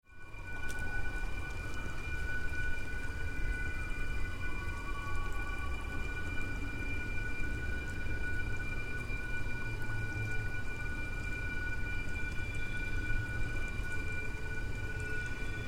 Ghost Train, Austin TX

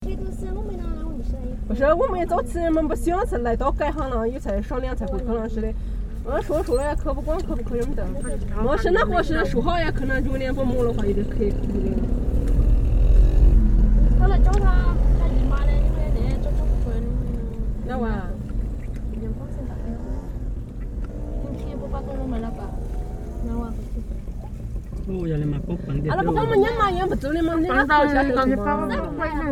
bus full, laos; Lively / debate (with a mouth full of orange) in a passenger van, in lovely, / dusty Udomxai bus station.

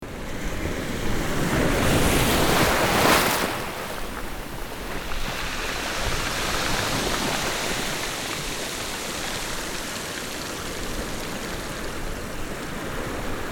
big island gravel; surf

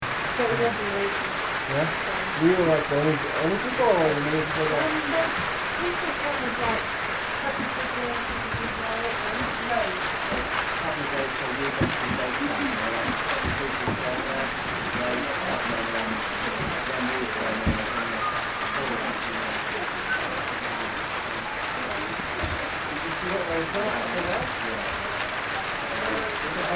basecamp hail

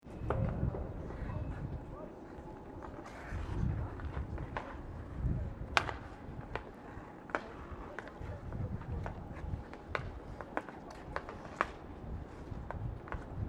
Amsterdam Museumplein Instrument

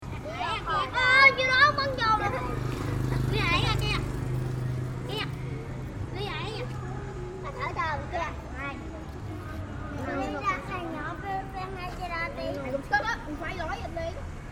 marbles

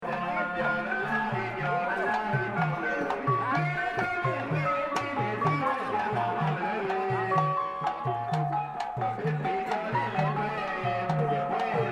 nat pwe, Burma

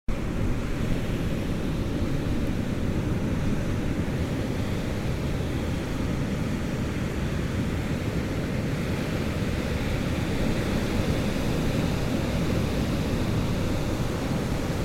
Flotsam Ambiance

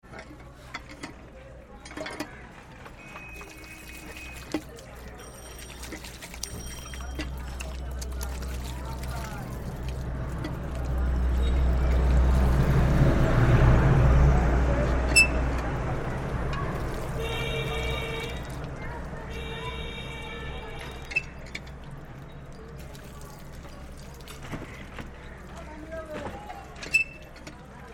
Communal water pump Khulna Bangladesh

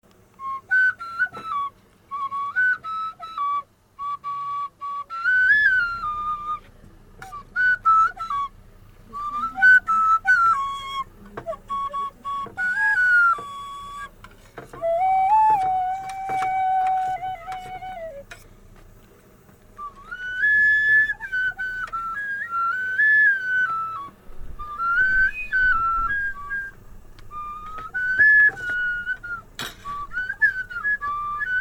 teahouse flute

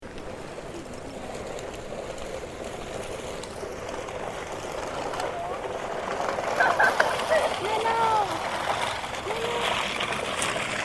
bamboo drag, laos; Freshly / cut bamboo dragging behind a twelve-year-old's bicycle outside Nong / Khiaw, in the northern hills.

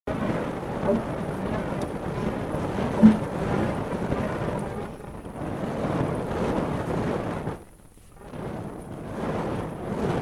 fire juggling

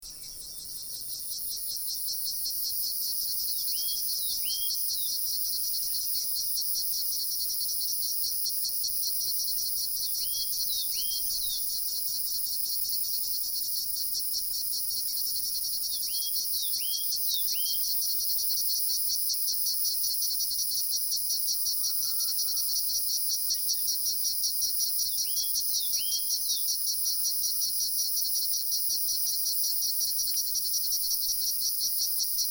cicada, nepal; Jomsom

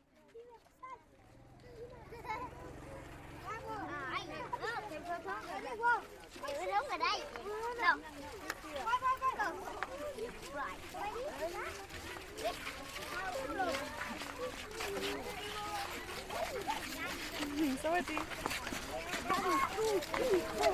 walking home from school

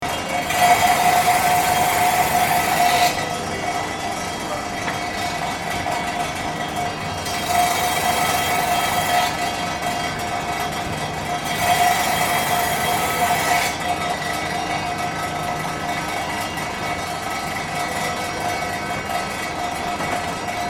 metalcutting

